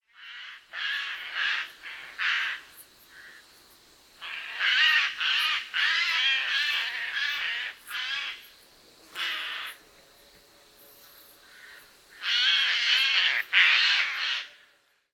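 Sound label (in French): geai, chants d'oiseaux; Geai des Chênes; enregistrés par Jacques Prévost de la LPO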